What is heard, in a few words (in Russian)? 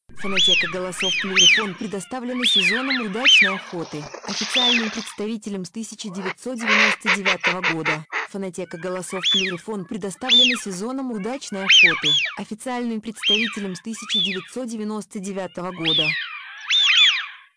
свиязь; plurifon